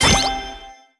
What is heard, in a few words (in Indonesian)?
snowman kebentuk bener; MiniGames